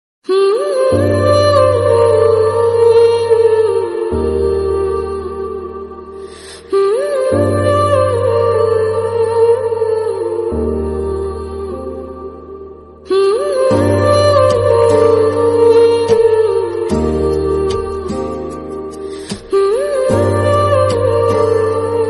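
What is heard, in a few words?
pakistani drama ost ringtone female; farq drama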